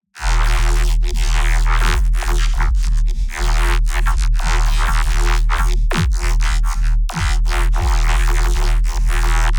granular neuro, bass

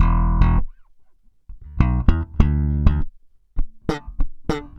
BAI BS EM, BASS BAION

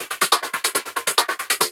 Loops 140 BPM Hihat Percussion Loops

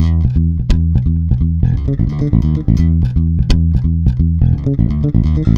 SCUFFLE, E, 174 BPM, Bass; Sampleheads; Ultra Freakin' Bass

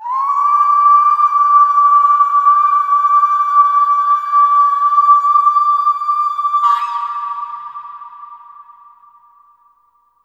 BERBER YEL, FEM. NOISE; Spectrasonics; Heart of Africa

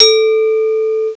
BELL, BELLS; CMI Sound Library Rev 1.3

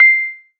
XYLO, MARIMBEL; Drum Hits; PERCUSSION

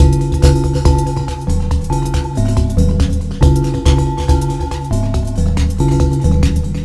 Drums Strange

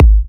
G#, kicks; markus hakala kicks

